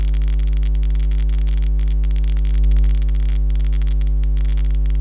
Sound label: electro